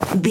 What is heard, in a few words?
foley cloth light fast movement